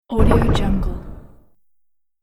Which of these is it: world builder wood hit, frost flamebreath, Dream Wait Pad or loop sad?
world builder wood hit